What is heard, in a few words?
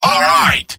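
positive vocalization, male; tf2; garrysmod; mvm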